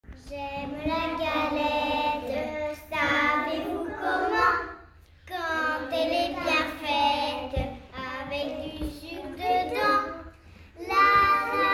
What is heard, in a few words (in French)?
Galette chanson ensemble, enfants de maternelle; L'histoire Roule galette de Natha Caputo revisitée par les enfants de maternelle